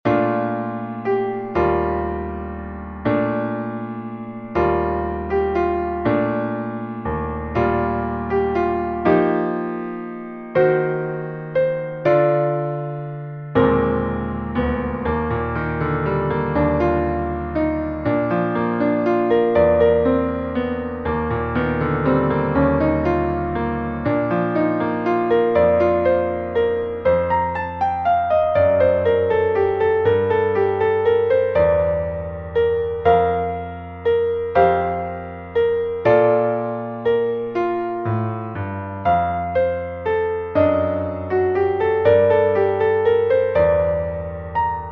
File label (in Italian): tromba Piano; astro del ciel; Stille Nacht; spartito per tromba in si bemolle